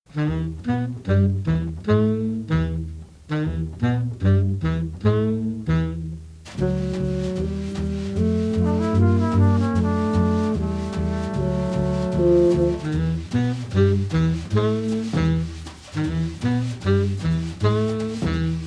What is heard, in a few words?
Soft shoe